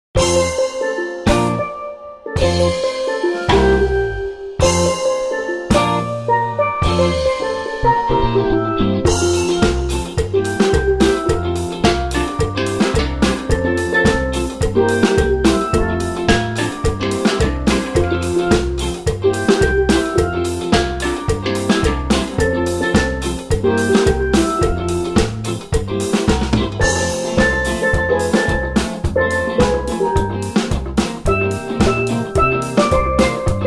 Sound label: steel drum band